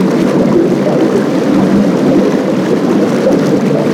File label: Galleon Water Tube; Warframe; Grineer Galleon